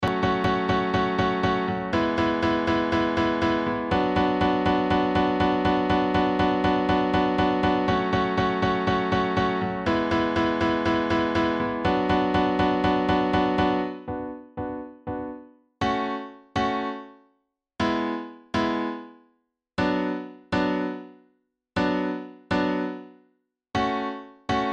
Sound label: Piano